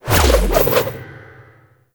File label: Spin Whoosh; Enemies; Foley; Generic Foley; Warframe; Duviri; Dax